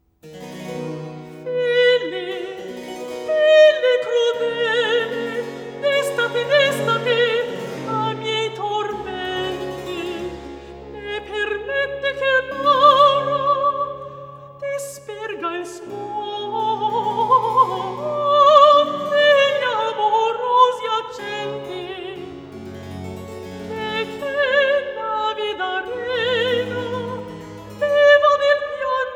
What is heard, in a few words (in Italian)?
Recitativo; Nel silenzio comune; Filli, Filli crudele destati; Scarlatti; Cantatas